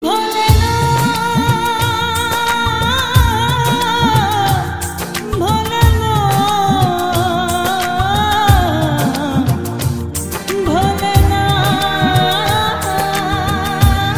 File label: Bengali sad new ringtone